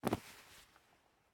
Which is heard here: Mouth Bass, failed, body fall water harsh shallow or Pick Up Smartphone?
Pick Up Smartphone